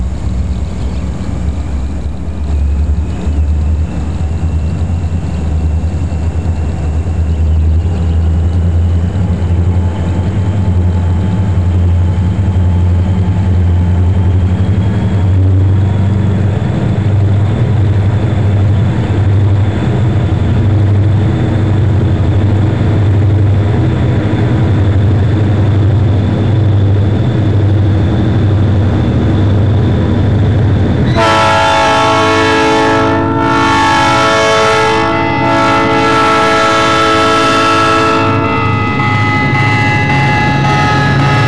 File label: pass train